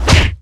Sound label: smack